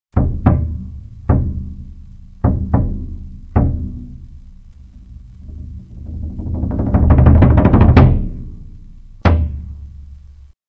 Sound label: bass drum; meet the percussion